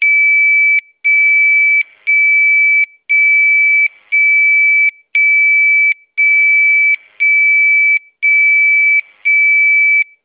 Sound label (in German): Probealarm